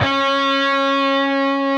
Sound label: PRS D, Guitars, LEAD; Spectrasonics; Hans Zimmer Guitars VOL-1